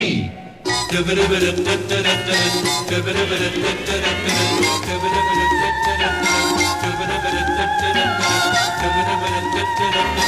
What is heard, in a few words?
Sweep, Radio style ads intros sweeps & jingles